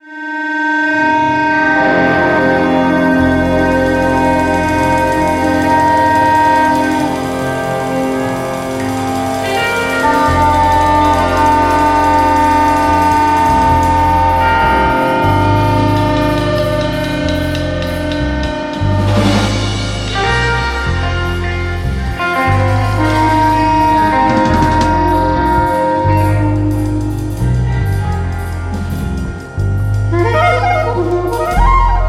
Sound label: lizard waltz, spiritual jazz